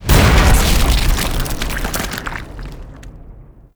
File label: Rupture Explosion; Narmer; Warframe; Corpus; Arachnoid Hunger; Arachnoid Kahl